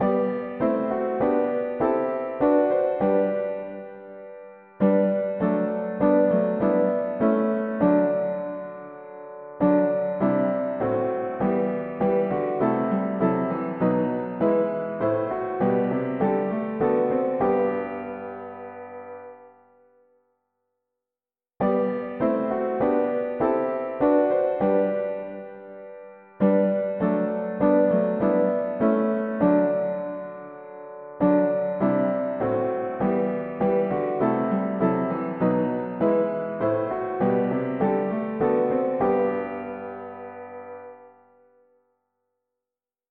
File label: mono-piano, hymns; Through waves through clouds and storms